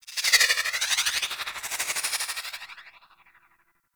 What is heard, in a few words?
Freeze Ray Laser One Off; Warframe; Weapons; Corpus